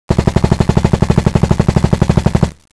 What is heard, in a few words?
fire